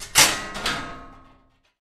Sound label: gate open, doors; svencoop; rngremake